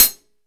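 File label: MIX closed hi-hat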